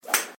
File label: Swing GOLF